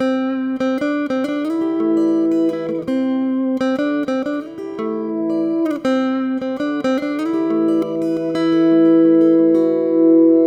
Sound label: cross corr melody